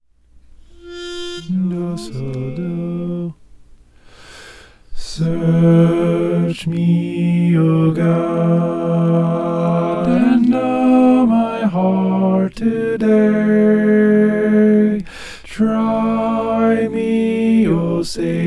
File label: tenor